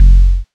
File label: kick dust click, lofi dust